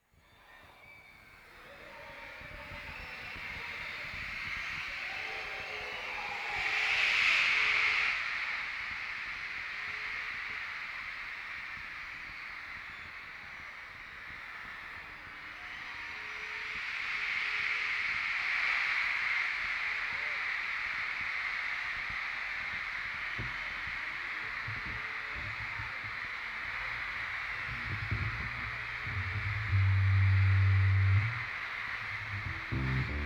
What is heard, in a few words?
Tuning; Hollywood Bowl